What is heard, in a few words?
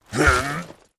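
damage, monster; ARCANE; scholar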